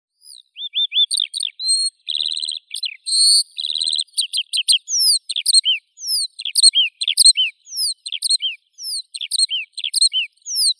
zhavoronok polevoi alauda arvensis; zvuki prirodi

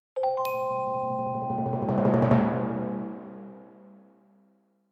perc presentational

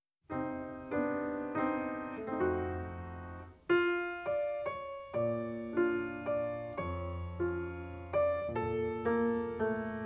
piano solo

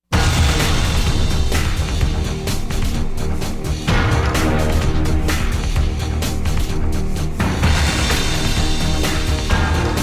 drums

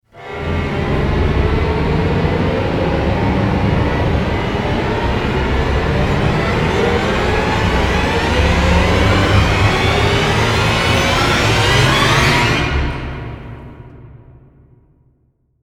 rise

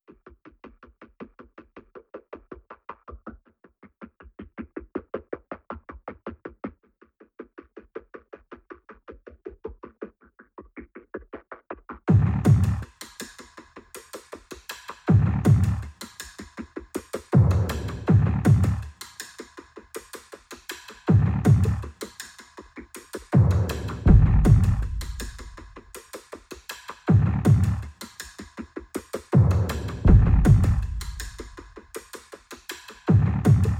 perc only

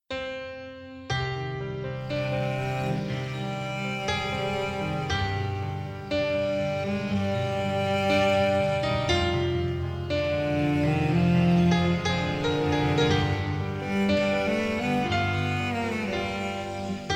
instrumental

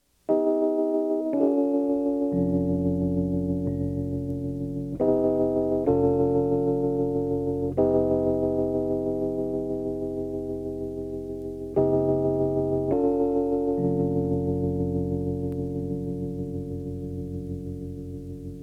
wide vibrato augmented chords

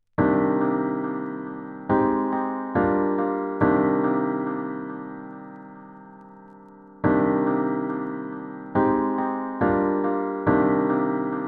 chillhop guitar slow and relax

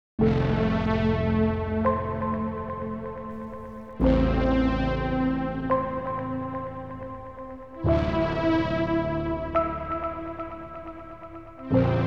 ambient

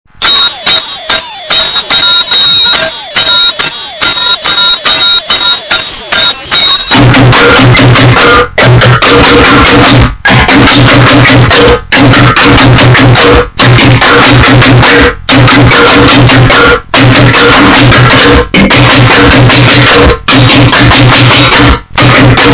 pop electrodance techno noise

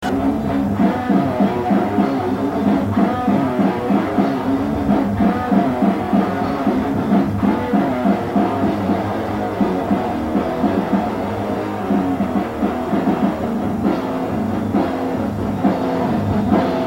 instrumental improvisation

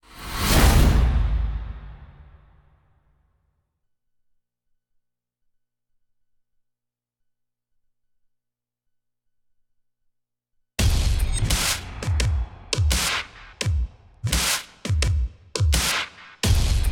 deathstep percussion only

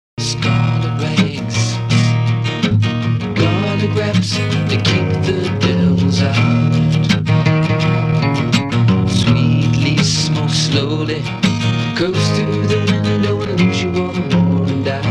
evening rain acoustic

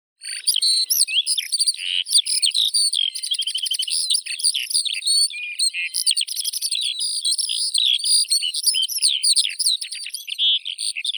zhavoronok polevoi alauda arvensis

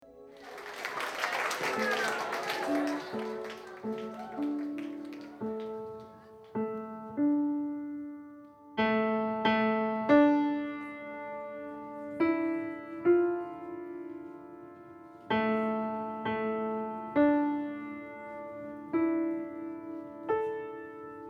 piano duet

